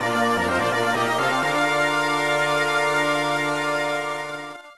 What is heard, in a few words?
Level Up; dungeons dragons tower of doom; cp system ii arcade